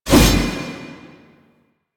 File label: Spear Gun Throw Fire; Weapons; Warframe; Tenno; Hoplite